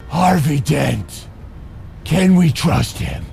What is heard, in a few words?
Is Calling Suspense